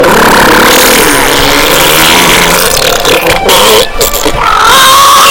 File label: man scream